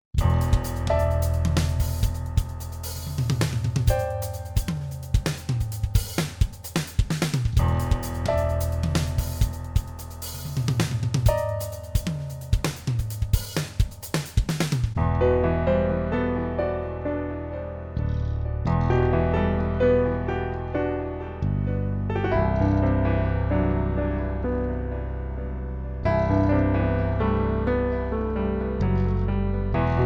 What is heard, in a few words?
Piano drummelo